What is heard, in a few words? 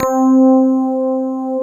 FM ELP 2 C3 R, Keyboards Old School; USB Soundscan vol.09; AKAI